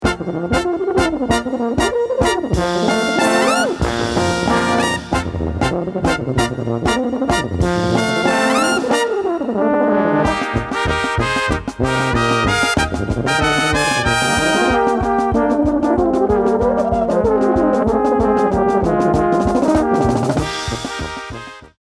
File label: Tuba